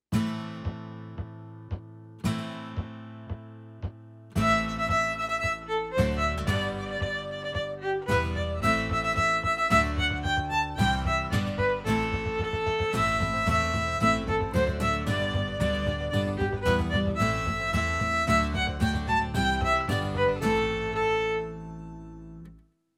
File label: Violin